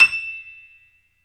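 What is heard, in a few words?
piano, Grand piano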